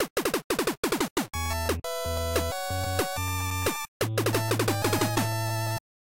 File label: fanfare